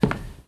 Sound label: Wood footstep